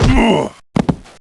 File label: Angel Death Sound; Heroes3